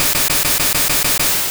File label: Pin Missile; Pokemon Essentials; PKMN Estelar 1.3